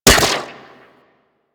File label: Speeder Rifle Fire